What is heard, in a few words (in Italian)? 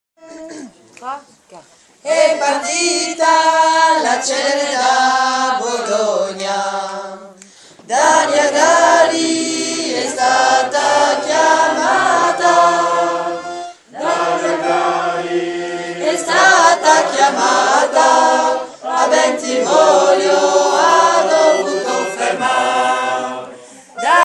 alti et basse